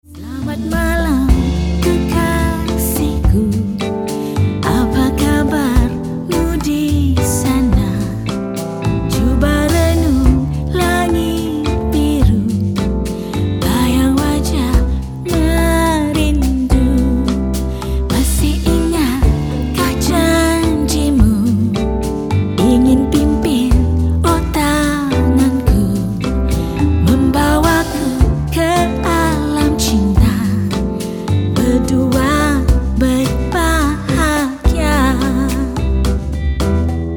bossa

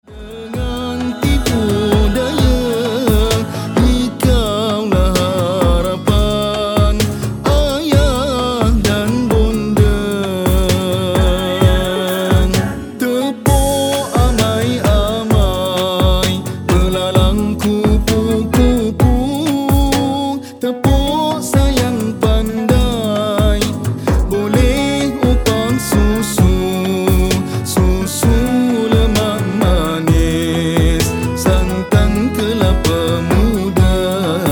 Modern Pop

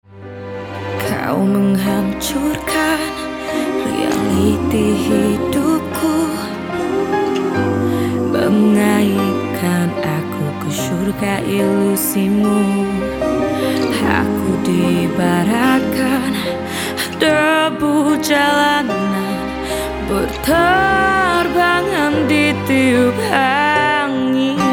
ballad

